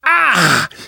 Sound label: hurt minor, survivor voice; namvet; Left 4 Dead 2; left4dead2 dlc2